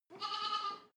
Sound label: goat lamb baa distant